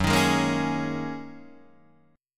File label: F sharp 6th Add 9th Guitar Open D, chord